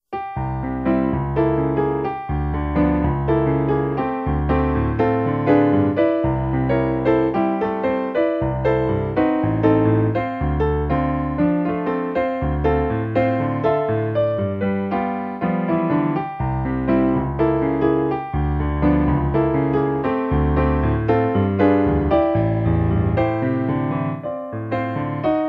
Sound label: echoes of music